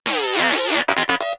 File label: Moa Inquisitive Vocal Greeting; Pets; Warframe